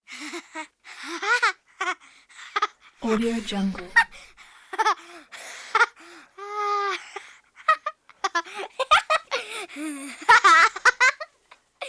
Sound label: childs laughter